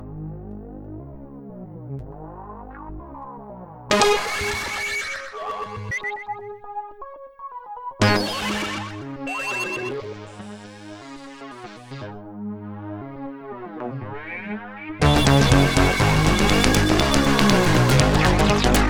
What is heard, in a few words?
polypunk